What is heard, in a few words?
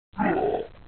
gorilla yell